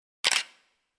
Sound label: camera shutter